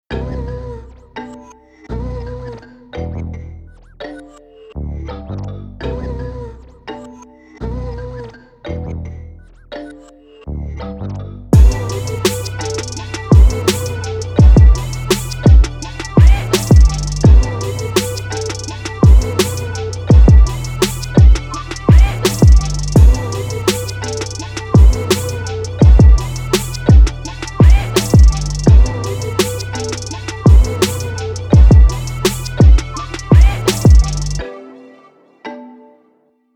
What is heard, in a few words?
tropical drumkit